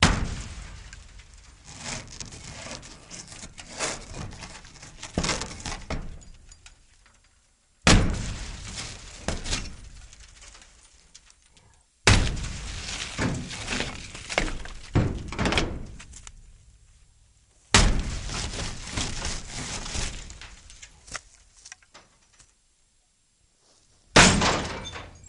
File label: Axe Throu Auto Windo, windows & mirrors